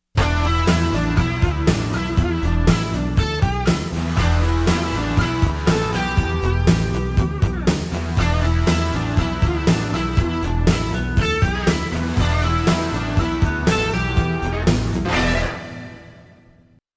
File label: Strat